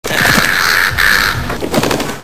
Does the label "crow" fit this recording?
Yes